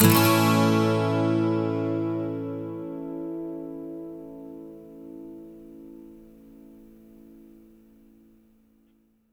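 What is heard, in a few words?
12 string major, Acoustic Guitar Loops; USB Soundscan vol.04; AKAI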